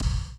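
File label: electronic bass drum; Best Service Hyper Dance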